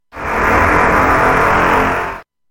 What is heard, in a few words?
Quad Damage; Quake 2